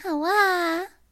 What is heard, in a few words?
awAHHHHH